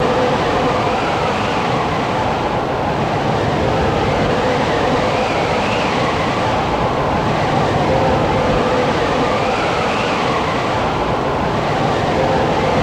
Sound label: Strongwind loop